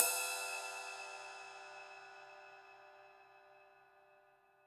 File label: ride sound sample e key